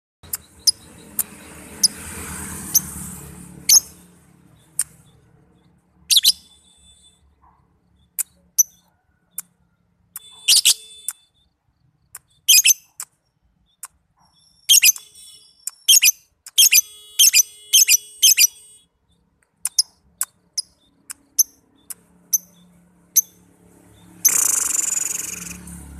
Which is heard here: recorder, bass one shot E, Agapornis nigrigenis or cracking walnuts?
Agapornis nigrigenis